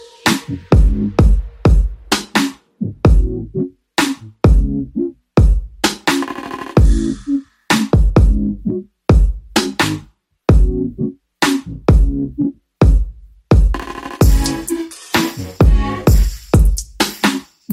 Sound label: mix music ringtone; english ringtones